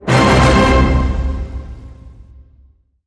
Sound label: Hit Orch Glam Triple C